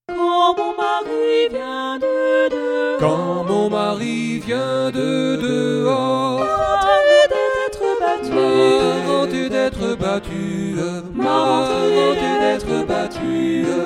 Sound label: Tutti; Quand mon mary